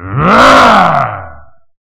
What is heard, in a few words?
Type F Atk; WarRock